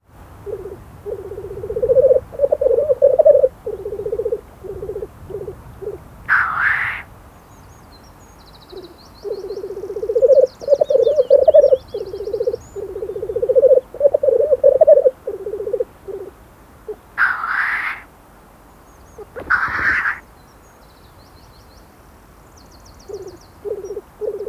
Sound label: Black Grouse